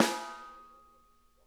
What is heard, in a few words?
SNARE EDG; Sonic Reality; Interactive Drum Kits